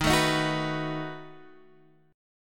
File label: B 7th Eb